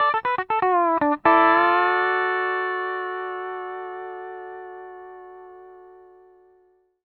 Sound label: Country, ENDING 120; PD ENDING120